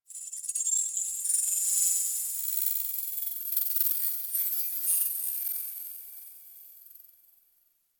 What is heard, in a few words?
Tech Texture Small, Ambience; Warframe; Cephalon Weave; DE